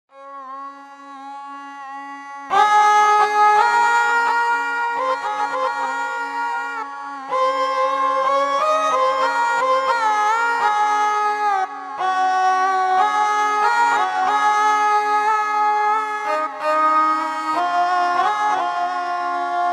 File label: sarangi